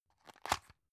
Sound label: drug close; arzt